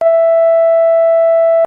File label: bleep